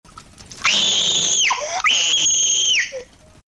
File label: screaming rage